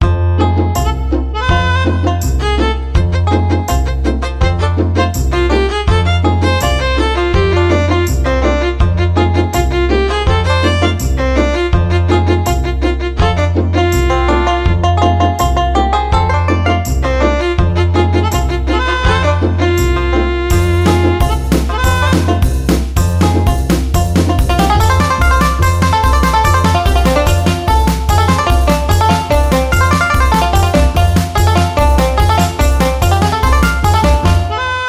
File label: Chickin Pickin